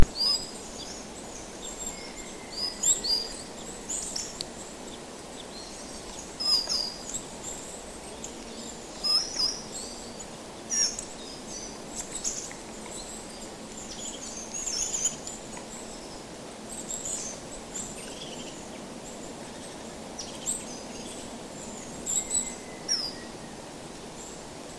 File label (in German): Grauspecht Rufreihe; Audiodatei Beispiel Grauspecht; nationalpark